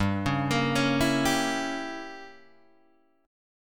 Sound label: G Diminished 7th, Guitar, Open G